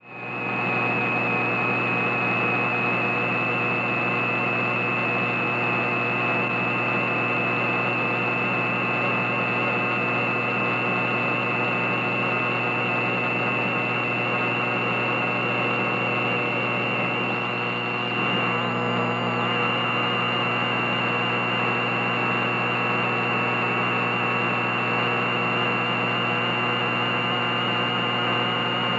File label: Futz Radio: Frequency Modulations And Static Interference; Mono